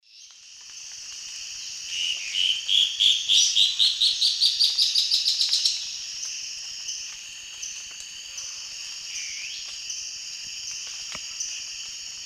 Thamnomanes ardesiacus, song; S. America; Eastern Lowlands

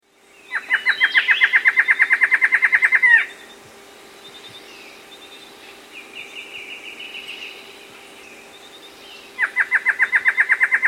Black-Hooded Antshrike; S. America

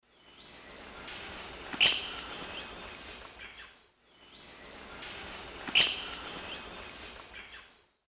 Piaya melanogaster, call; S. America; Eastern Lowlands

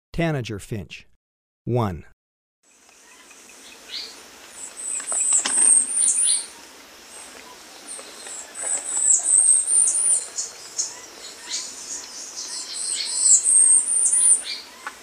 Tanager Finch, Birds of Ecuador; S. America

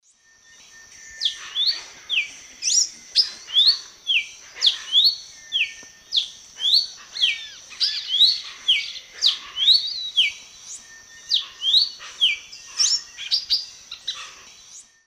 Paroaria gularis, song and call; S. America; Eastern Lowlands

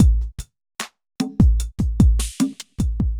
evil beats 75

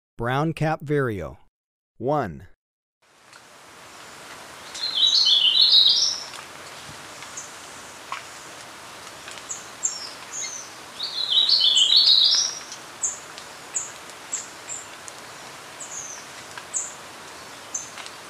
Brown-capped Vireo, Birds of Ecuador; S. America

